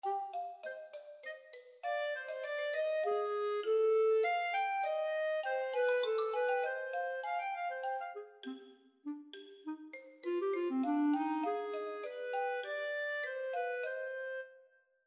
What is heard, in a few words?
inventing free counterpoint; The first task for this project was to create a second melody for two given short melodies